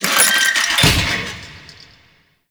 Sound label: Lift Hinge; Warframe; Duviri